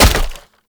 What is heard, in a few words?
Shield Burst Catch; Warframe; Infested Lich; Hard Drive